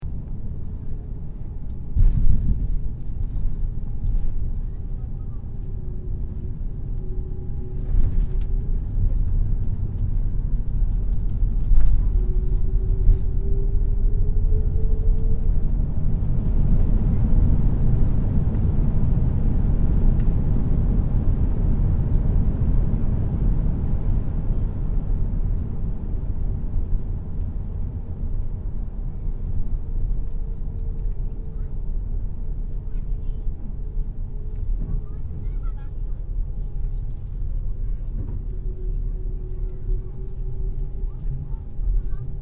Airoplane Boeing 767 Interior Touchdown, Airliner interior recording